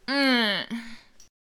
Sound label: Death Vocals; Ballooner